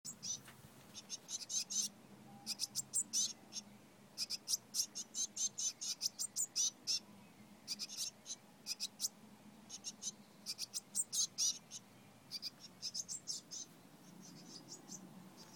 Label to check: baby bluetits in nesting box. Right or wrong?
Right